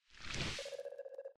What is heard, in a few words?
UI menu open